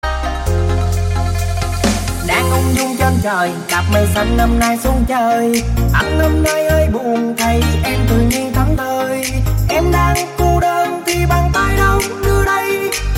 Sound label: EDM Remix